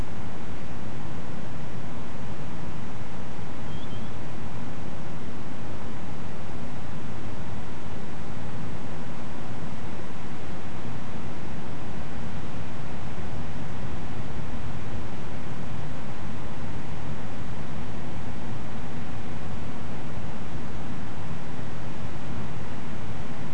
unid waterbird two calls 3-3.5kHz poss Grey tailed Tattler; nocturnal flight call project in beijing autumn 2021